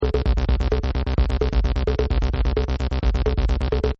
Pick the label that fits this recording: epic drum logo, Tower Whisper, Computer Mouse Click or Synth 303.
Synth 303